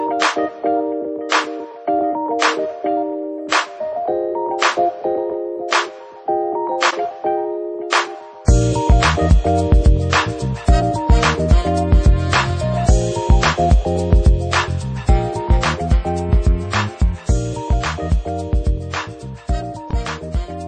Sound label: romantically melody